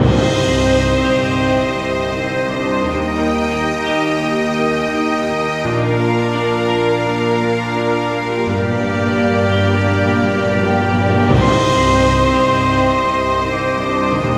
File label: dramatic guitar; by burak sound bank; dramatic dram vol 12